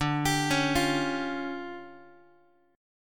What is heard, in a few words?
D Minor Major 7th Double Flat 5th, D, Guitar; DmM7bb5; arpeggiated; JGuitar